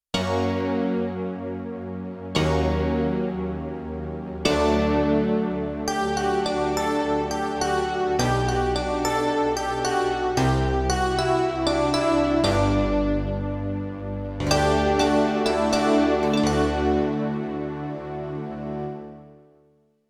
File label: Synthesis; Europop Performance 1